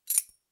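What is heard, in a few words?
babochku otkryli, butterfly knife opened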